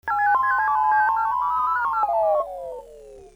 Computer off

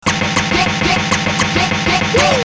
scraches

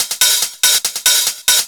Hat Loop

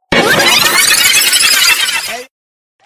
reload

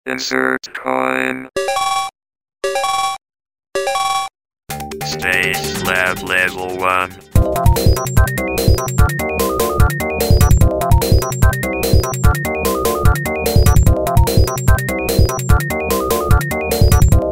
insert coin

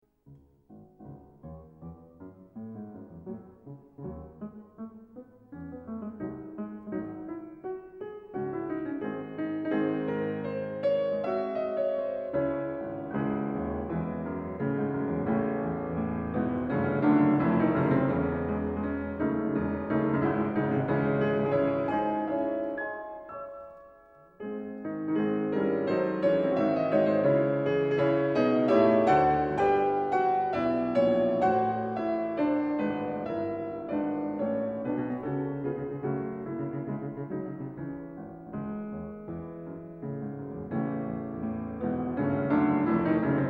Symphonic Etudes, Piano; Schumann

